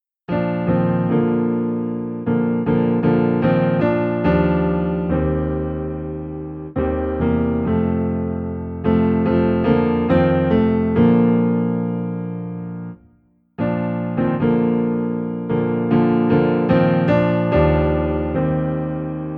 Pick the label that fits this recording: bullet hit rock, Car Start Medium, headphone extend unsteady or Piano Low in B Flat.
Piano Low in B Flat